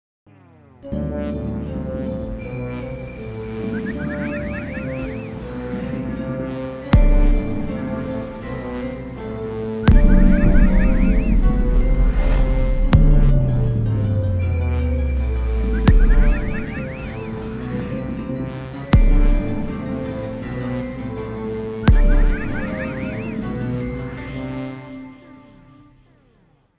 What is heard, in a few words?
chime